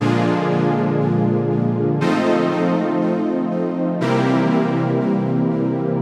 as dur nebenakkorde

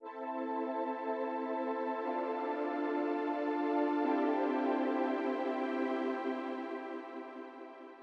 akkordbeispiel a moll d moll c

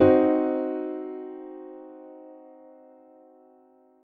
c dur vermindert

